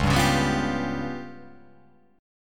C sharp 7th Flat 5th, chord

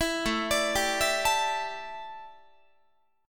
A 7th Suspended 4th, A, down, Guitar

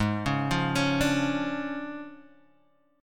D Minor Major 7th Flat 5th, Ab

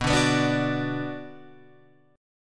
B-Minor, Guitar, Open F; strummed; JGuitar

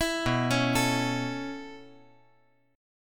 B 9th Suspended 4th B down

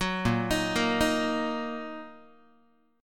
A sharp Suspended 4th Sharp 5th A sharp, Guitar; arpeggiated; JGuitar; 9,6,6,8,7,x

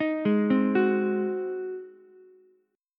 Ab 7th Ab down Ukulele; Ab7 arpeggiated; JGuitar

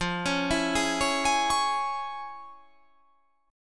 F Minor Major 7th Sharp 5th

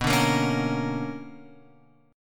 B Minor Major 9th; BmM9 Chord {7 5 8 6 X 6} strummed

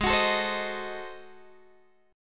Ab 7th Flat 9th down Guitar Open G

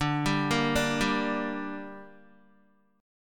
D Suspended 4th Sharp 5th, Guitar; arpeggiated

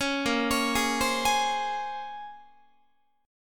Bb Minor 9th Guitar, chord; Bbm9; arpeggiated; 16,18,16,18,16,19; JGuitar

